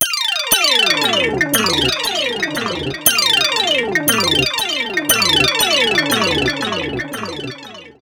Gliss 2fer Gliss, lounge, 118; Zero-G Kocktail Kollection; Construction Kitz (Lounge); Gliss 2Fers Mixes 118

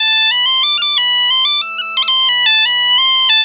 AM BPB, Celtic Flavours, BAGPIP090; USB Soundscan vol.42; AKAI

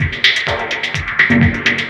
Structured Rhythm, IDM Rhythms

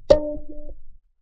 bag finger; Univers Sons - Raricussions

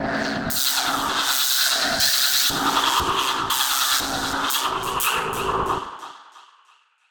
Synth FX

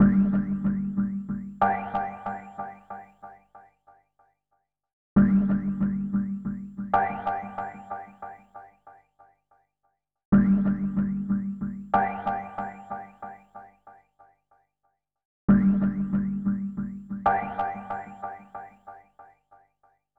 Filter Pluck, Guitars; Zero-G Smoke

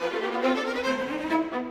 Strings; Sonic Foundry (Sony Creative Software); Orchestral VOL-2; Modern 12

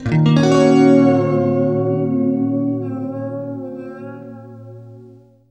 FLESH CHORDS, Guitar

